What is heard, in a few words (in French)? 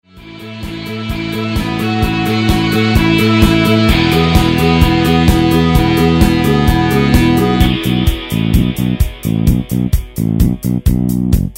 instrumental